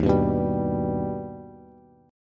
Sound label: Eb Augmented Eb, Bass; strummed; JGuitar